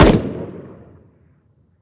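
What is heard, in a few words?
weapon fire player; weapons; gmod; viper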